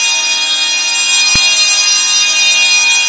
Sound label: D#3 BELL, TURBOBELLS; Northstar - The Wizard VOL-1